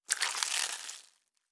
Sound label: Blood & Gore; Survival Game Sounds - Lite Edition; Combat and Hunting